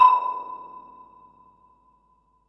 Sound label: Perc, Percussion and FX; Pearl SC-40